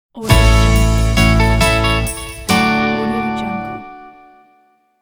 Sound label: melodic rock logo